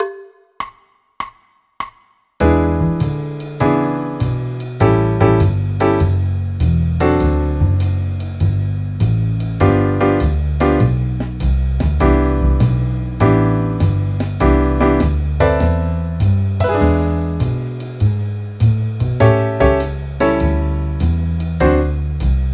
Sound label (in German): c dur akkordverbindungen